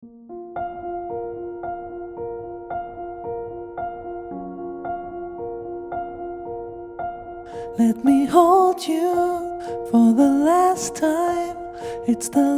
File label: backtrack